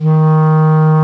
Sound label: WND CLARI, Clarinets, Clar Bryt; Roland LCDP04 Orchestral Winds; 90 s Sample CDs